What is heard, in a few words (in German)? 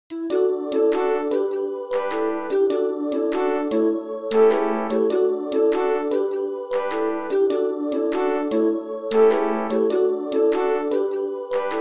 Begleitung D-Dur